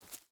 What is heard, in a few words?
footstep boots grass jump start; Rust